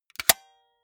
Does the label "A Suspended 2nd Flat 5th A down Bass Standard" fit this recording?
No